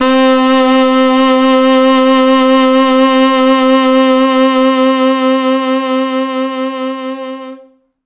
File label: T Sax, c3